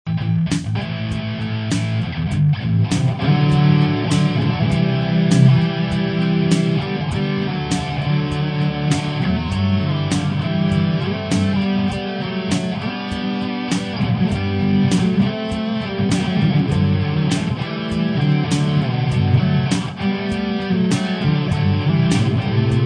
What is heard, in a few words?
harmony